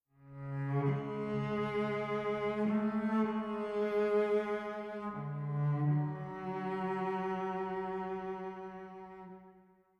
Stinger Short, game soundtrack; Pillars of Eternity; Stinger Thaos Short Building Block 03

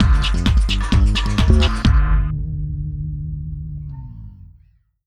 Stinger; Skeleton Man Level 3

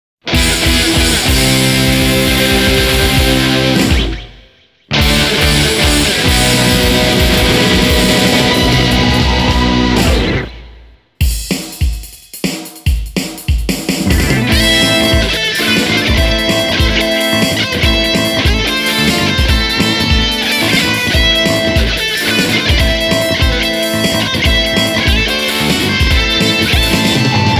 Classic Medley (Rock Hen); Taiko no Tatsujin: Tatacon de Dodon ga Don

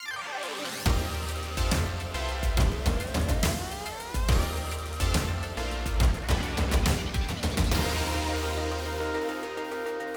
BGM Versus Fes Start Demo

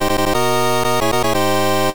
Power Up; Exed Exes; arcade

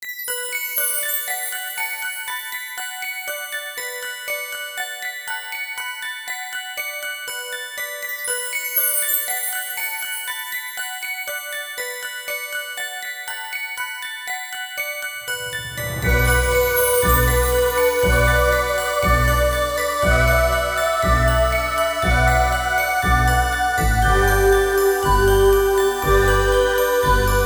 Sounding the Alarm; Floating Material

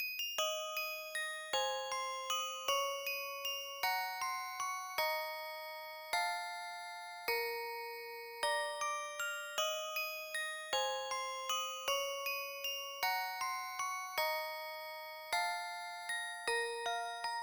Music Box; Kakyuusei; Saturn

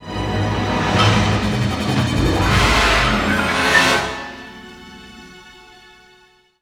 Battles Imperial Lose; Hoth

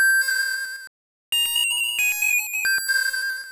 Sing; sfx; attack moves; pokemon; gen 2; gsc

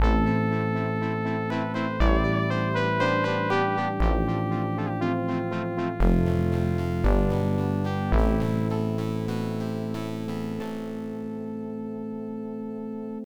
race over; World Circuit IBM PC AT

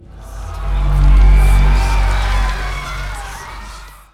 hell sounds

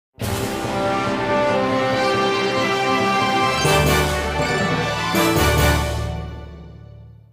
Player Win; Dynasty Warriors Gundam 3; Gundam Musou 3

